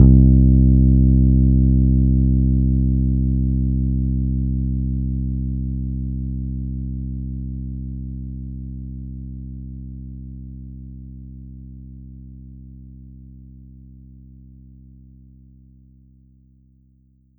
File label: Yamaha EX5 Old Strings C2